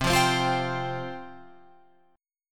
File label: C Suspended 4th C, Guitar Open F; Csus4 strummed